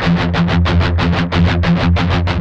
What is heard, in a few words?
16TH F#2 L, Guitars; Spectrasonics; Hans Zimmer Guitars VOL-1; MED 16TH